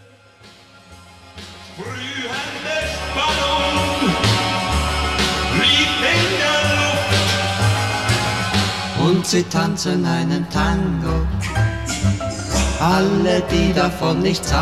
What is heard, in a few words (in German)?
Musik; Attac; LoRa